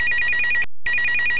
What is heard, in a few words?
Talk Ring; AOL